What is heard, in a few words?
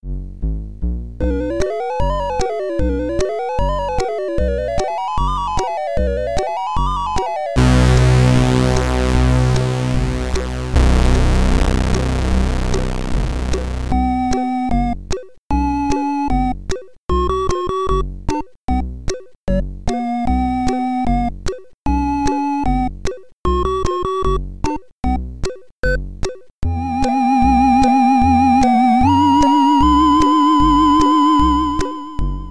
Alternate Version SID Stereo; Ghouls n Ghosts; Commodore 64